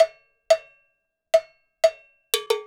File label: Cow Bell, Latin Percussion; Sonic Foundry (Sony Creative Software); Joe Vitale Latin Percussion